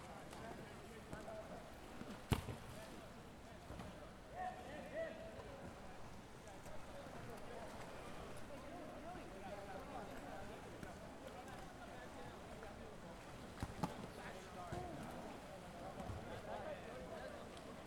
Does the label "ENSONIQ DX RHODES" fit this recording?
No